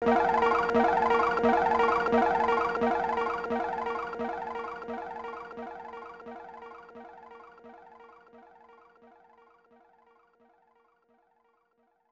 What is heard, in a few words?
Warning; Darius II; arcade